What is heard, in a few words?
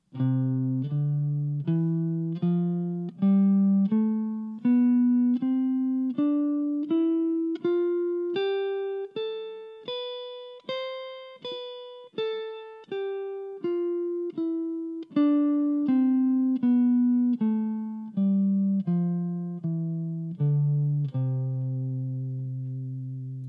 C Major Scale